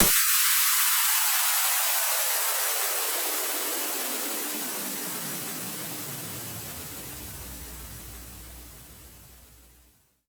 Tension White Noise Down, 140 BPM; Cymatics

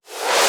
Mini Sweep

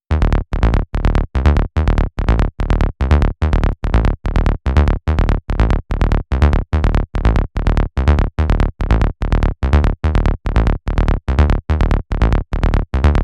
Bass Dark, PsyTrance; LiLA'c Records PsyTrance Sounds 01

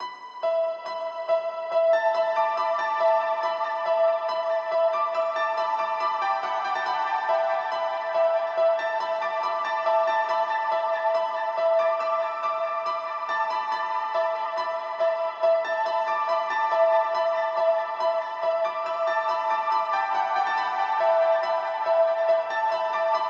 piano wet

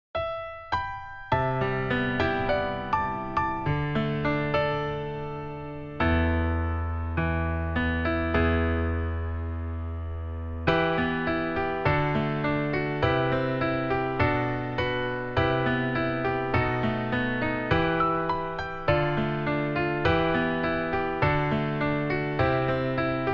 instrumental

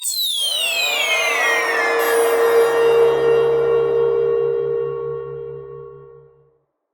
FX; LiLA'c Records PsyTrance Sounds 01

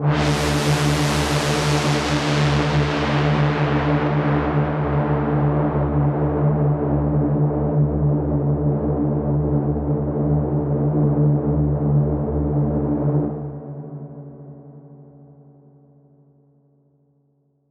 Pad, PsyTrance

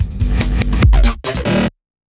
FILL, PsyTrance

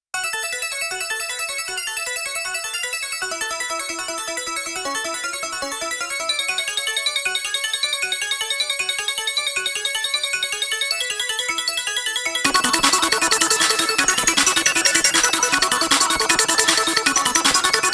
Off Vocal

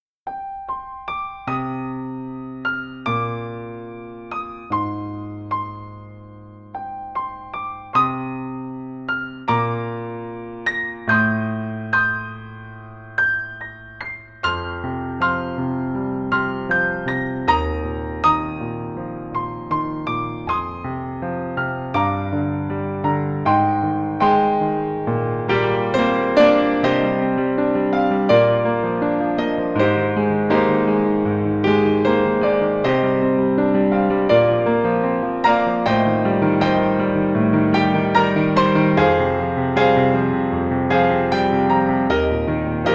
ver. violin

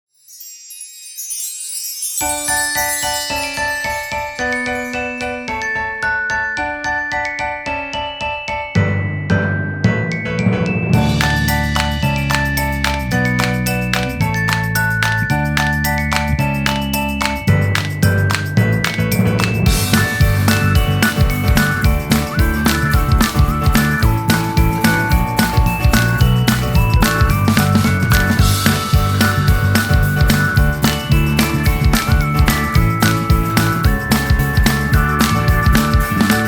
Instrumental

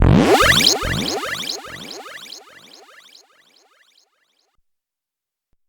Zap, PsyTrance

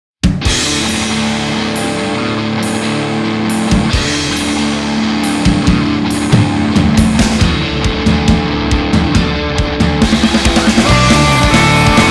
off vocal ver.

